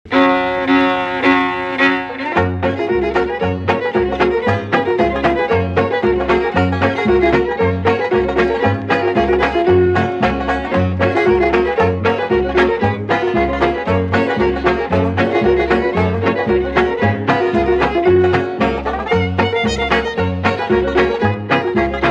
circassian circle square dance reel (instrumental)